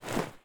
Jump; SandBox Musics

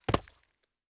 Land; SandBox Musics

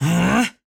Male Sprint; SandBox Musics

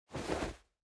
Dodge; SandBox Musics

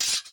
Hit; SandBox Musics

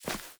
Step Walk Grass; SandBox Musics

